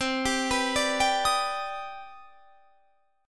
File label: C Minor Major 9th C